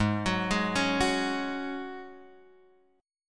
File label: G sharp 7th Suspended 4th G sharp, Guitar Open G